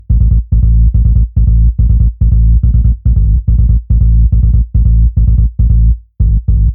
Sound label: Psy Bass Loop Elves Step Dirty Compressed